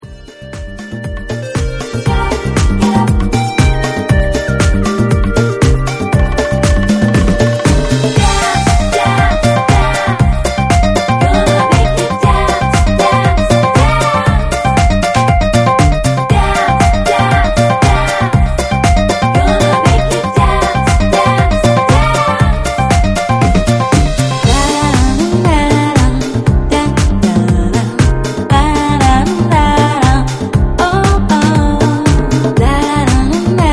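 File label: Jazzy Dance cosmic funk remix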